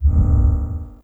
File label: UI Zoom In; DE; Warframe